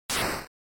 Noise Phrase, NES; NES-General

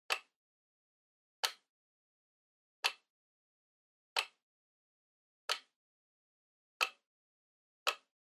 Flip Clock Time Dial Slow

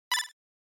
Fighting; GB

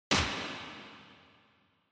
Motion Grab Reverb

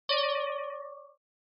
Negative Timbre; Onoma

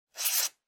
Felt Tip Pen Circle

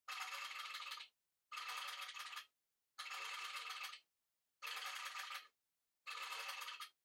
Flip Clock Far Time Dial Fast

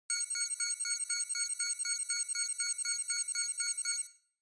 Cyber

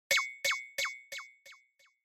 Onoma Surprise High Delay

